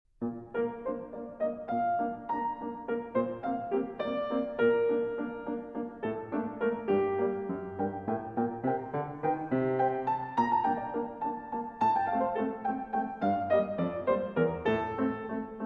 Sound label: Preludes, in B-Flat Major. Allegretto poco moderato